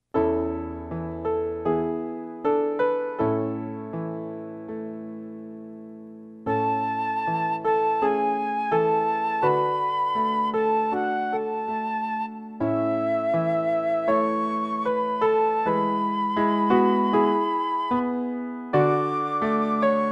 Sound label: A, high, piano acc.; Long Time Ago